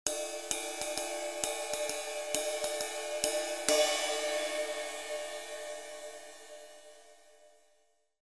20in Custom Sizzle Ride, cymbal sound

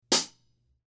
K Custom Hi Hats Closed Hit

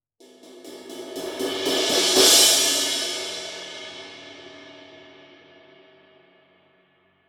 Thin High Crescendo, ride cymbal; 20" Kerope Thin High Ride

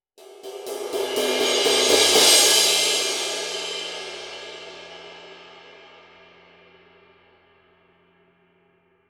Medium Low Crescendo, ride cymbal; 22" Kerope Medium Low Ride

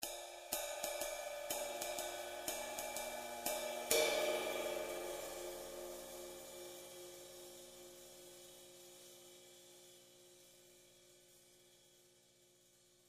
20in Custom Left Side Ride, cymbal sound

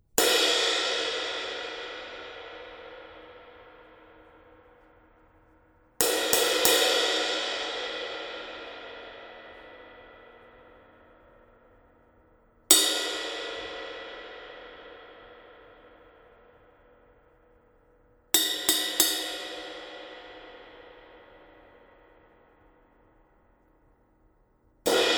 Cluster Crash, cymbal

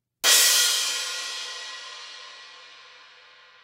S Trash Crash Crash, cymbal

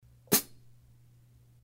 Chick, cymbal sound; A Avedis

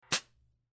14 K Custom FX HiHats Chick, cymbal

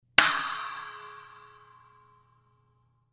S China Splash Crash, cymbal

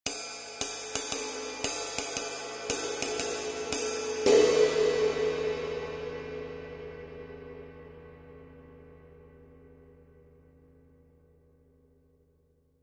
20in Custom Flat Top Ride

